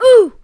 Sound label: grunt; Messiah